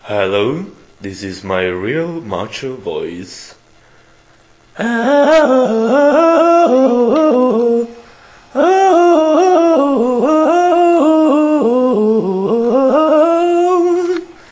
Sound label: autotune